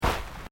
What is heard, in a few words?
FOOTSTEPS SNEAKERS GRASS STEP